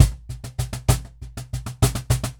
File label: Cajon Loop 100BPM; musicradar